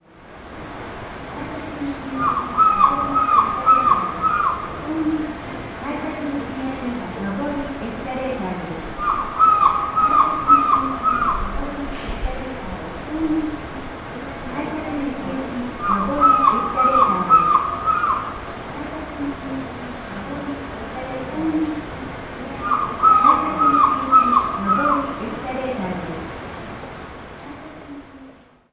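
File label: escalator sound beacon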